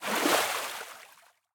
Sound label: fish splash; imperialriches